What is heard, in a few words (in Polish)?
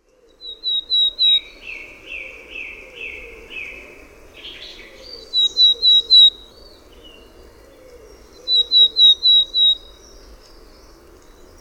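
czarnogłówka, ptaki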